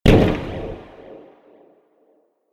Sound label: impact